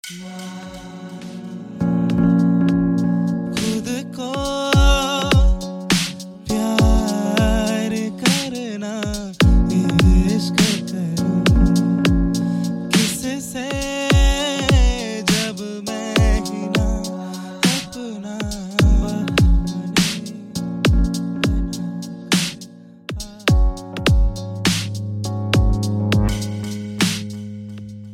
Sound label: LoFi Beat